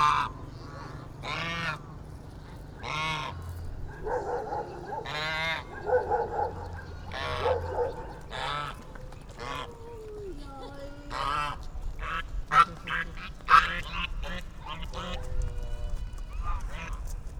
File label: countryside; field recordings; Vietnam